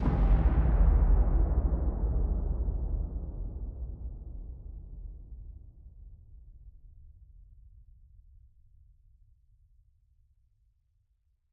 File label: end flash, ambient weather; minecraft 1.21.10